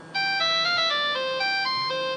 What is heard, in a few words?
Cellphone; Jurassic 3